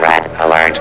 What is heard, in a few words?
ALERT